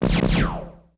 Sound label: Laser; Lasers; MicromanÃa 35